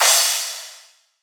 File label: Riddim Crash; One Shots; Hats & Cymbals; Ghosthack; Riddim Essentials 2020